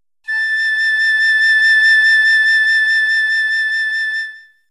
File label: high A7 piccolo